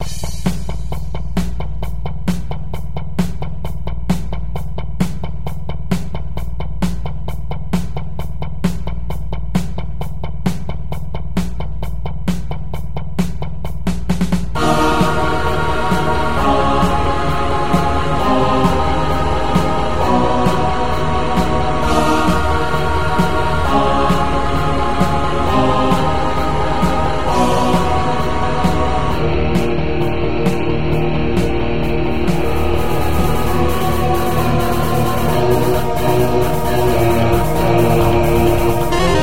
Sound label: Harpsichord Flute Strings Brass; RetroMagix Harpsichord; Syntheway